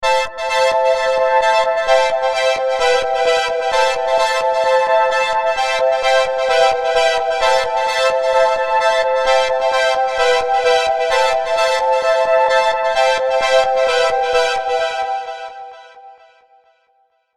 blue Strings

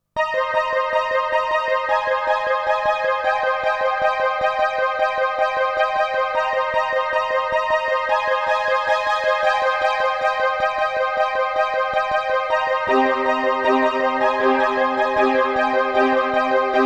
Stereo Strings

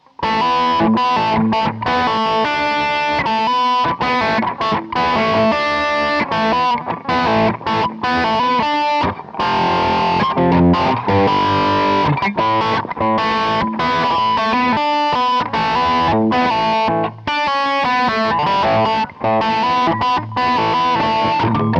Artemis Fixed Wah; Spaceman

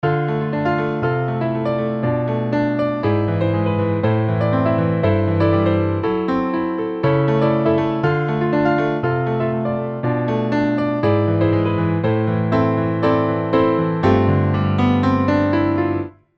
EZkeys 2 Acoustic Songwriter

